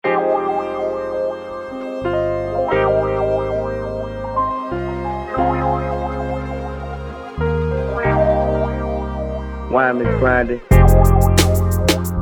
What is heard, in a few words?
Instrumental